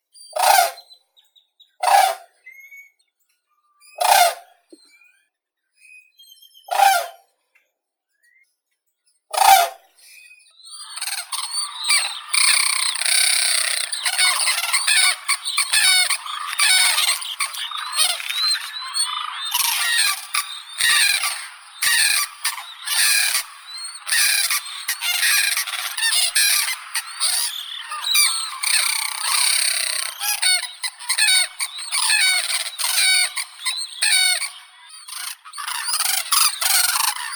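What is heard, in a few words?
white-naped crane, Grus vipio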